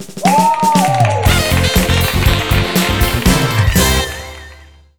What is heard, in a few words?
Correct; Dinox; Wii U